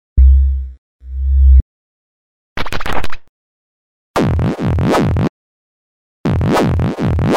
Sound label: synthesizer